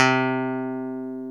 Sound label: C-1 pick, pick bass; AKAI S-Series CD-ROM Sound Library VOL-7